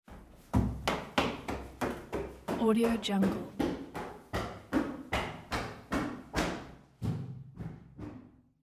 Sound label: running upstairs with small steps in slippers